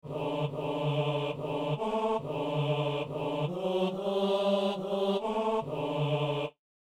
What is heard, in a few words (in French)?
hommes; Psaume 121 (122); Messe du 17 janvier à Saint-Denis